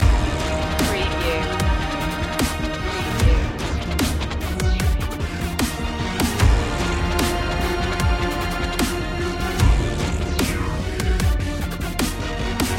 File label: dark power loop